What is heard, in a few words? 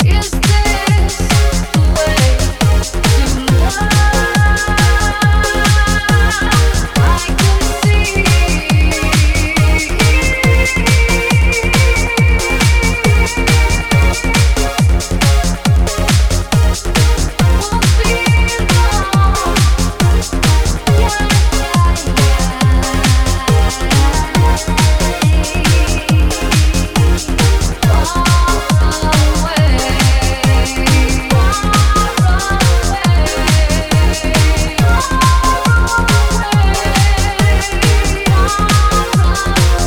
trance